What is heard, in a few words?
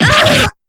damage; weiss